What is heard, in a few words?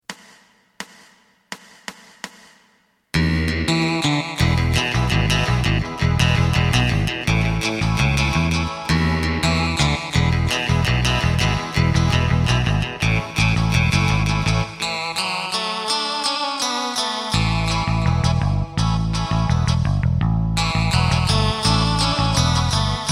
drum; エレキバンド用カラオケ